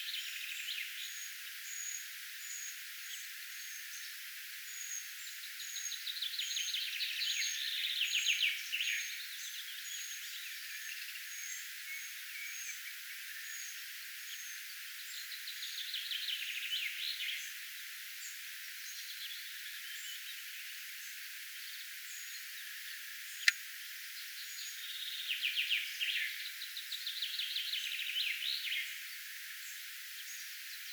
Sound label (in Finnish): nuori jo itsenaistynyt puukiipijannuori aantelee